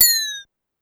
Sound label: Bell Down, Pitch Env; Clavia DDrum-AT; Pitch Env Various